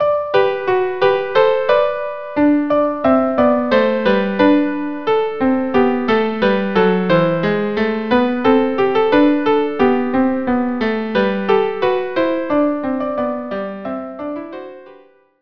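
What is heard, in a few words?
Bruchim Habaim violin viola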